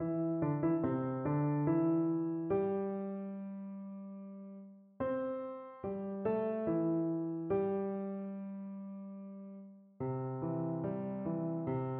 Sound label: piano